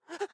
after effort breath; Nut Boarding

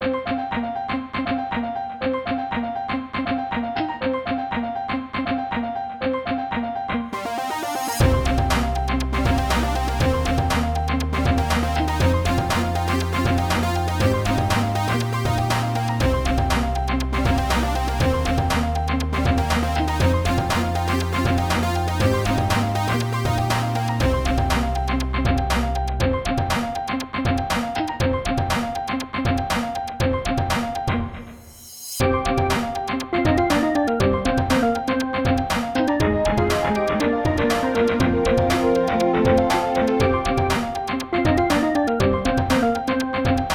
Main Music; Plants vs. Zombies

